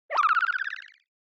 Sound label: Motion Slide Mid